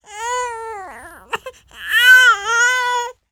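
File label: TALK, baby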